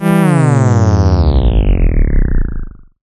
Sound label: Drop, Synth FX; musicradar; cartoon caper